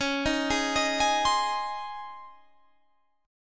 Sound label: C sharp Major 7th Suspended 2nd C sharp, Guitar Standard; arpeggiated; chord; 21,18,18,20,21,20